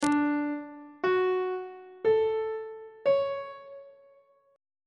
f sharp phrygian 7th chord 6 is d major 7th on treble clef